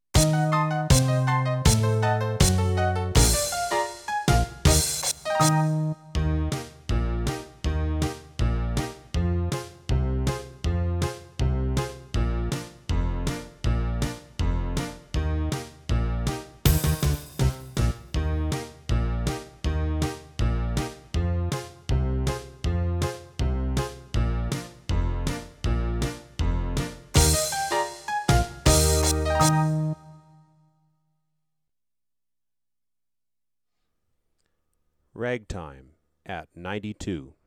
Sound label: ragtime